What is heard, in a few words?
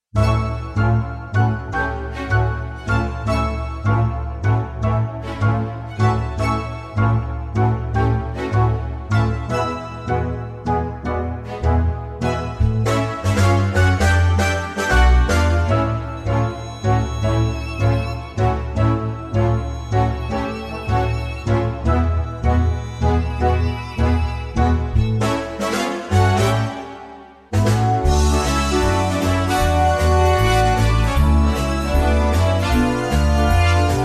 ACCOMP A